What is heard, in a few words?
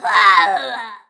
death; Alien Arena; ai